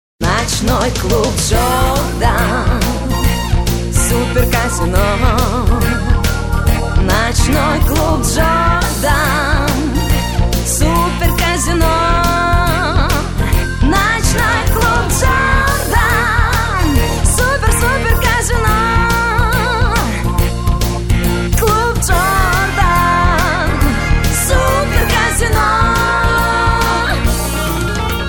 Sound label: Music Vocal